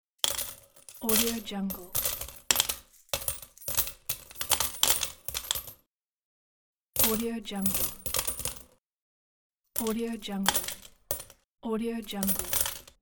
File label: coins falling